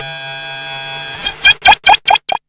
TELEPORT, Hard & Loud Techno; USB Soundscan vol.01; AKAI

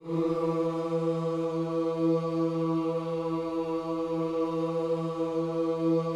OOS E 3 E, Gospel; Spectrasonics; Vocal Planet

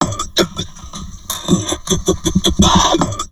TALK, Drum Loops Crazy Processed; 160 TALK A; USB Soundscan vol.07; AKAI

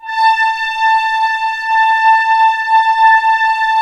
orchestra A4 PP; Sound On Sound - Peter Siedleczek's Orchestra

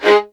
G#3, LOUD VIOLINS; Q Up Arts; Denny Jaeger Master Violin Library

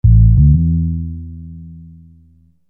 Sine Bass

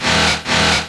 alarm; Ueberschall; Techno Trance Essentials

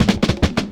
Snare Fill OS; Foundry (Sony Creative Software); Rondo Brothers Trip-Hop Loops & Samples; Hard Rocks The Roof

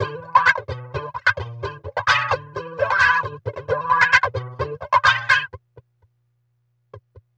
SHANK SKANK, Bizarre Guitar, WEIRD WAH; Spectrasonics

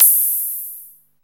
FIZZ, SYNTH PERC; Zero-G; Ambient 1

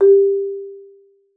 marimba G4; Xsample VOL-4

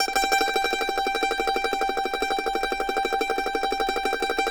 MANDO TREM G5, Whole Lotta Country; Sampleheads; Whole Lotta Country 1 & 2

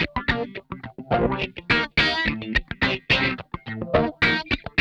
RAW, Funk Guitar; 90 s Sample CDs; Zero G

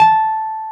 PNO, Wurly

